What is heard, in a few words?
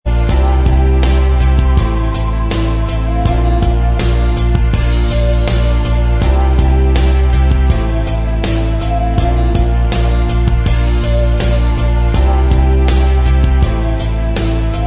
Inst Track